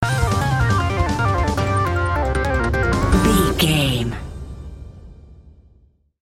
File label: video game boss battle stinger